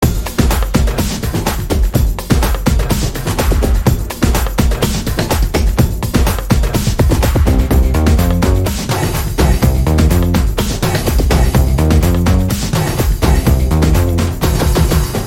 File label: Drum Beats